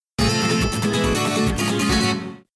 Victory!; Dillon's Rolling Western; Nintendo 3DS

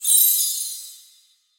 Explosion Ice Sparkle; boomerang fu

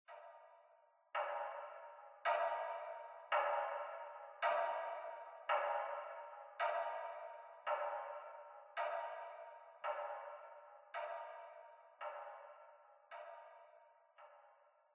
Clock Interior; The Sinking City

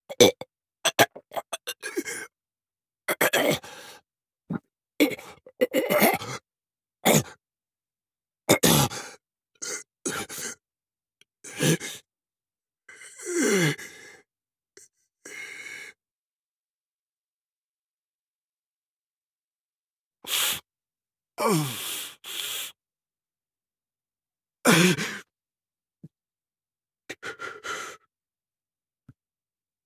Death Sounds

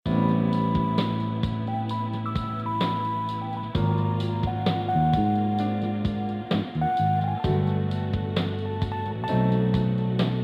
Jazz Radio; Luigi's Mansion 3; E.Gadd Selection

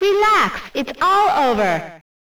Round Clear; Fighter Attacker; arcade

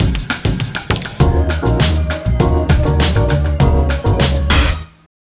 Music Tennis

